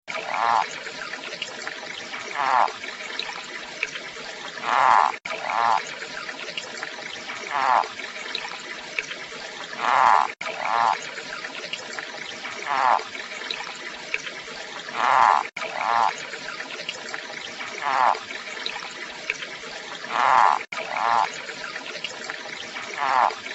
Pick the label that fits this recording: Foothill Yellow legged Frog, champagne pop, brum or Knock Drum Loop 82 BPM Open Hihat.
Foothill Yellow legged Frog